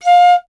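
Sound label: flute piccolo F; Miroslav Vitous - Solo Instruments VOL-2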